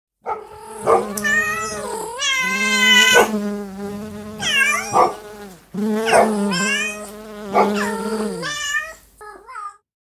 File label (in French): trio abeille chien chat; loto sonore; loto des animaux